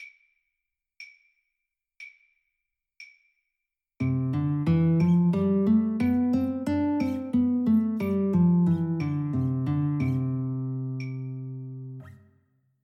Triplets; Beyond Chord Melody Volume II

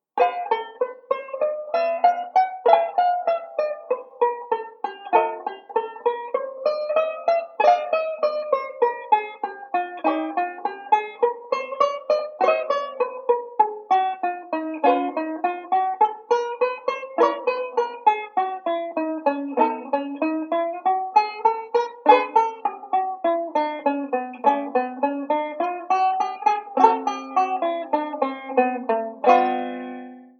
banjo; Beyond Chord Melody Volume II

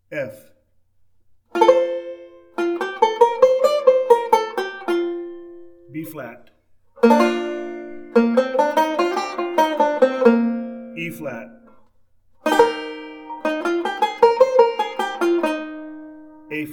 Root Circle Drill, banjo; Beyond Chord Melody Volume II

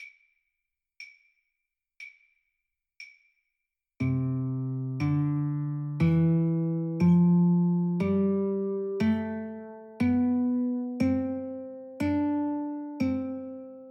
Quarter, banjo; Beyond Chord Melody Volume II

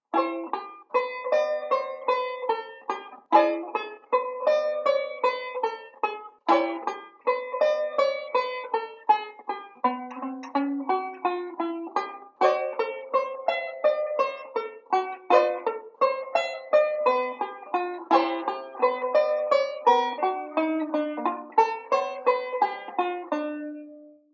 Arpeggios; Beyond Chord Melody Volume II

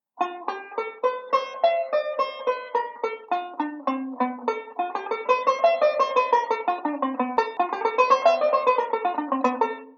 ii V Speed Drill, banjo

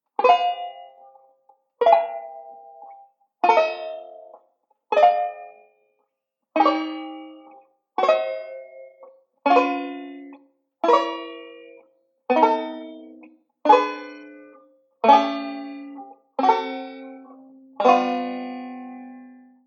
banjo; beyond chord melody volume ii

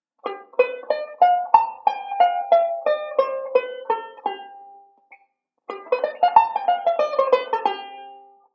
Modal Sequence, banjo

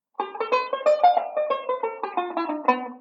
Mixed Scale, banjo; Beyond Chord Melody Volume II

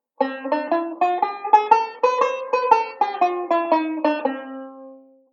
Bebop Scale; Beyond Chord Melody Volume II

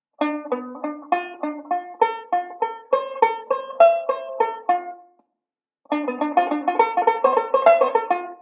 ii V Pattern, banjo

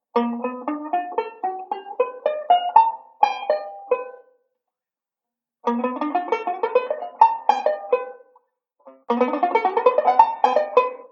Octave Arp, banjo; Beyond Chord Melody Volume II

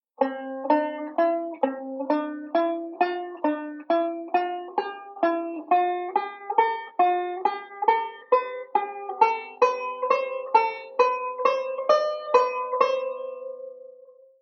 Returning Scales; Beyond Chord Melody Volume II

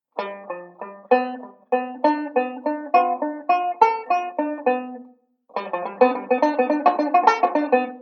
ii V Pattern, banjo

